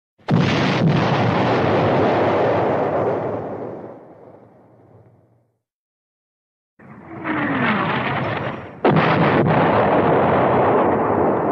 Explosion

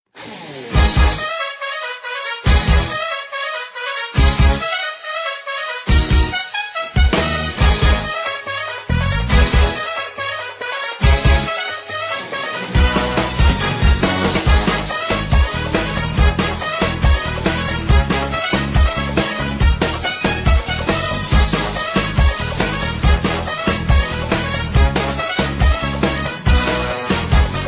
tribal; latino

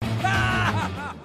Insane Laughter

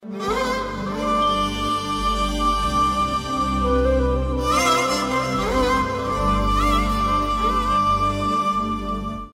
violon

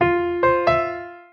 notification

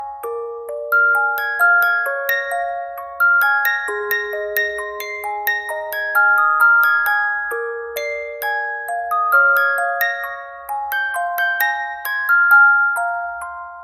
music box

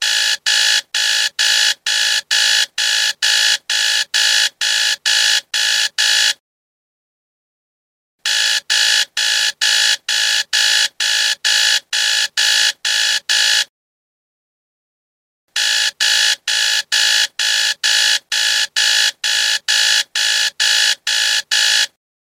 Alarm Buzzer

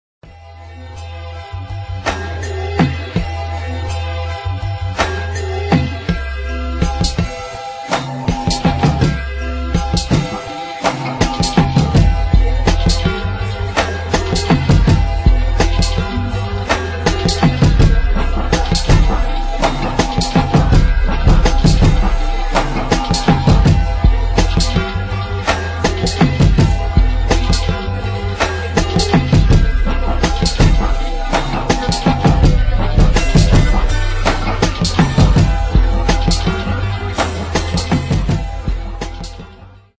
instrumental

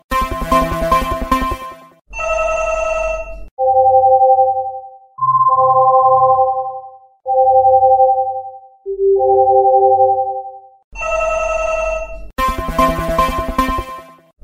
Galaxy S4 New

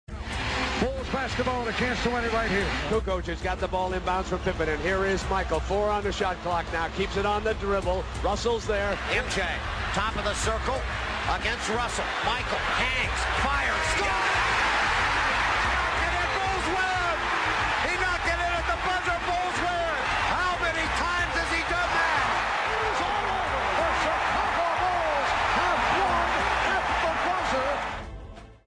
buzzer beater; michael jordan; 97 finals

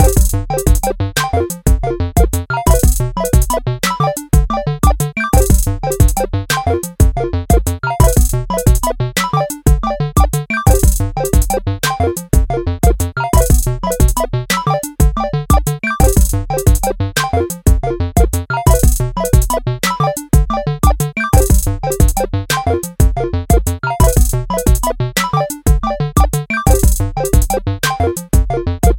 Chip Hop Beat

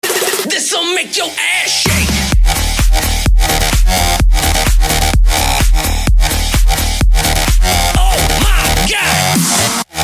Electronica

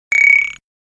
easy sms; ringtones

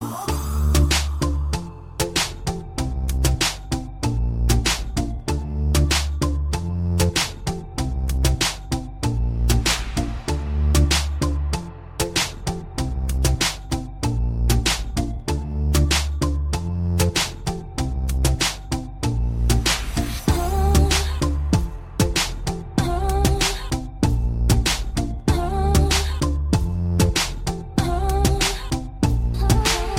Electropop

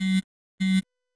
Cell Vibrate

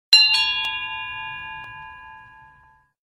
Gong single tone

